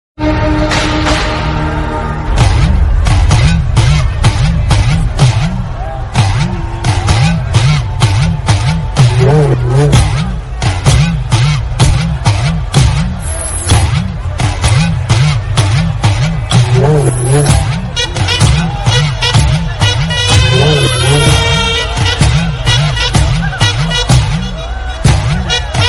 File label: bgm telugu; spyder; mahesh babu